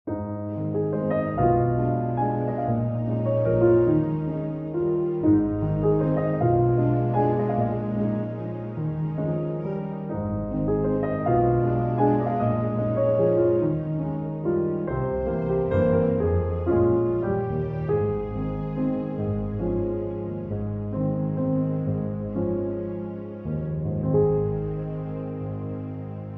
Music Box With Strings; 30sec

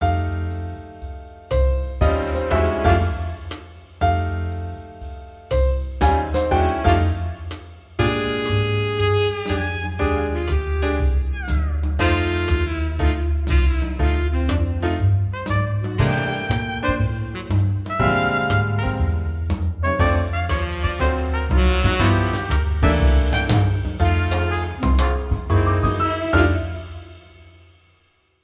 Swanky Swing

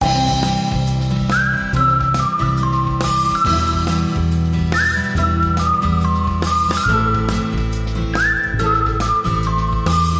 Happy Whistler

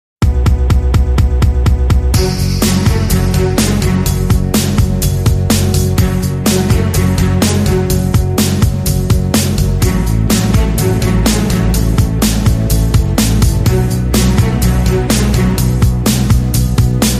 Different Strings Short Sub

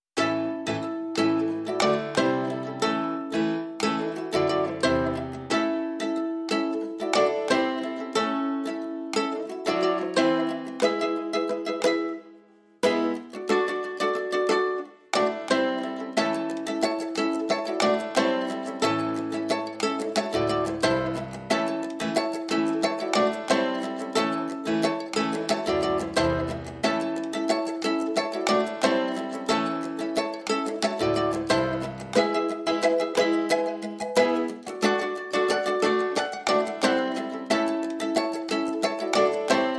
Banjo Blues Ukulele Piano

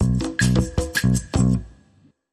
Clean Sting

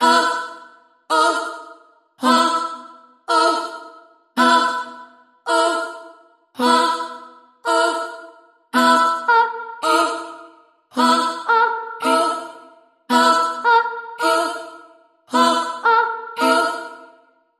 Vocals Female Chant

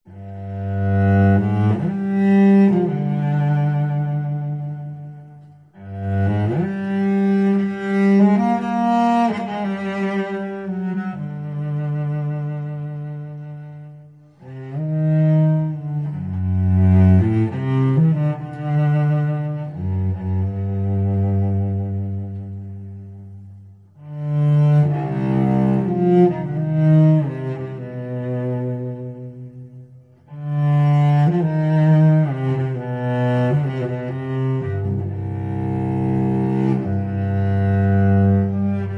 Melancholy Cello

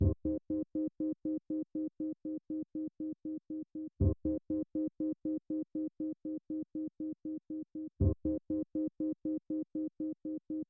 Automatic Pulse, No Percussion

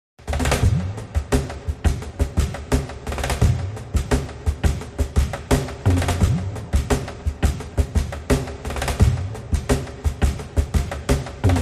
No Gongs Drums Only

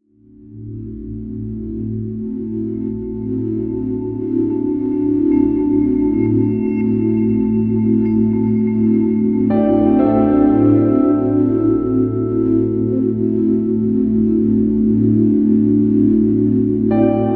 Drones and Piano

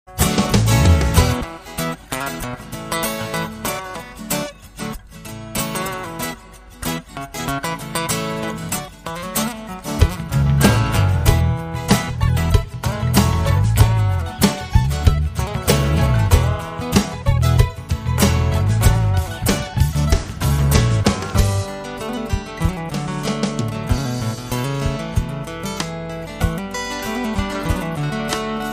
Gaelic Groove

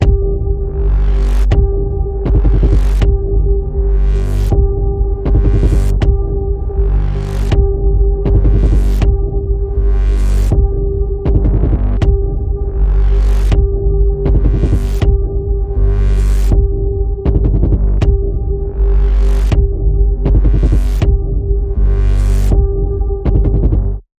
Ominous Chase Panning Pulse G, Key of G

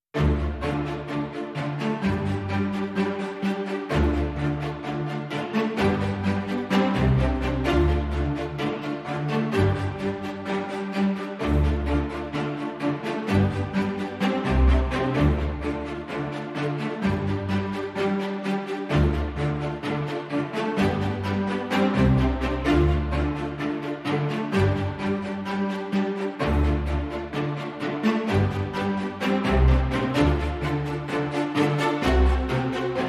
Strings Mix

